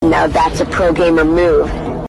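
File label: notification; pro gamer move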